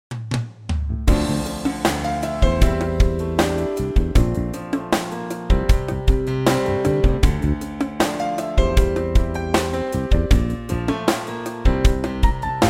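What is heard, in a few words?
D minor trio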